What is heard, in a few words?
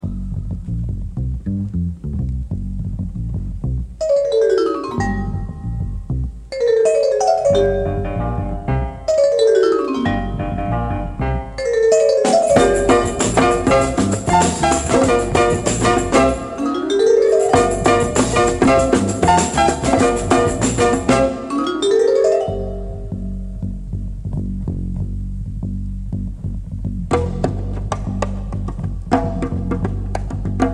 Bongos & Sound